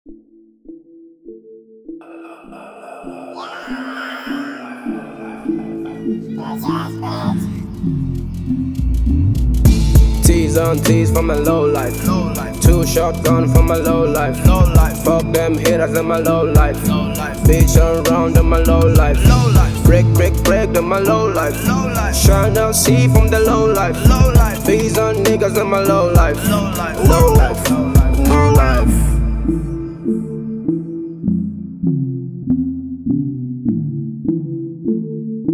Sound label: Cover, beatbox